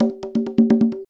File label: Fill, Conga; percussion; musicradar